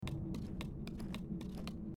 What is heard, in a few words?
movement standard; Zelda - Breath of the Wild; link